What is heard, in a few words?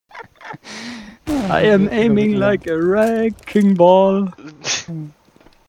jingle